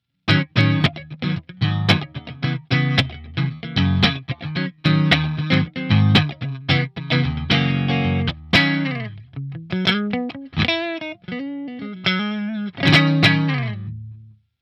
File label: archaettype clean; Helixシリーズのマルチエフェクター; helix amp model